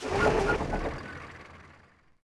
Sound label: Tank Heal; Civ4 warlords